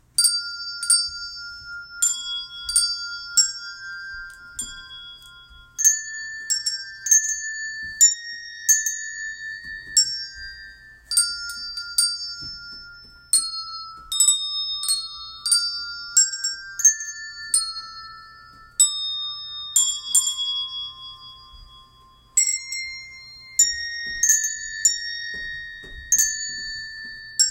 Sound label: O come all ye Faithful Bells